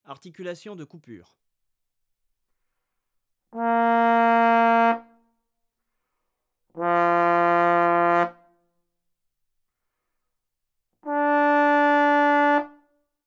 articulation de coupure, trombone; Effets; Effets d'articulation; traite orchestration; trombone tenor trombone basse

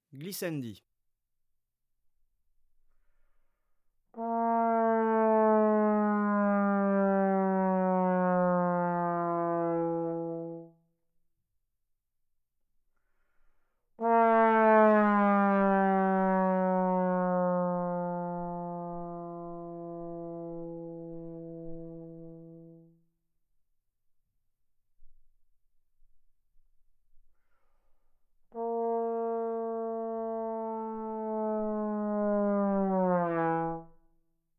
glissandi, trombone; Effets; Glissades; traite orchestration; trombone tenor trombone basse

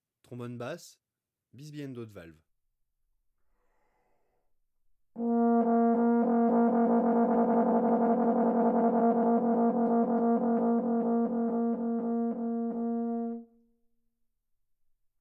basse bisbigliando de valve, trombone; Effets; Sons alternatifs; traite orchestration

